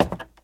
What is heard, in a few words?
wood; firefight